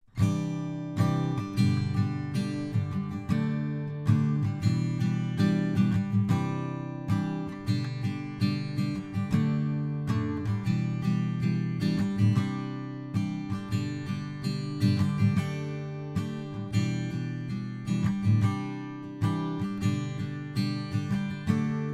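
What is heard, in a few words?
U87 strummed guitar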